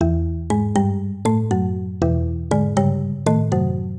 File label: Marimbesque 120 E, Loops n Lines, 120bpm